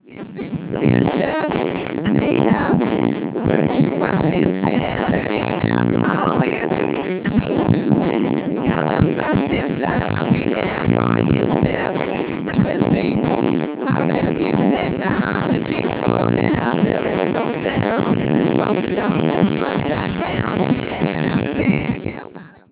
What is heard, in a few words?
music test 3200bps codec2